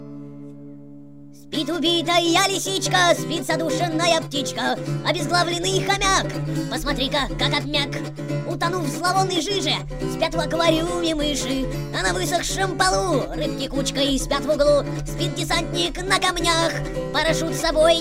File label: Lullaby